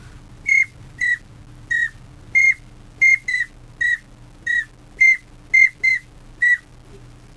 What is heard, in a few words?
pintail whistle, hunting calling